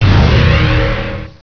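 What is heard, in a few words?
weapon pickup; Hexen2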